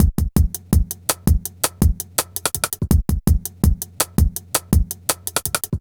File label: Stick Break 165, Drum Loops, Jungle; Jungle Warfare 1&2